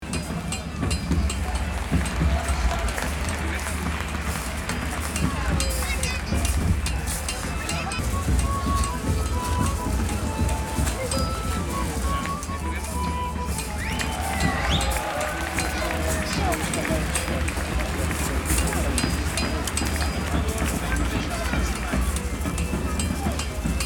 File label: Medieval festival ambience crowd music cheering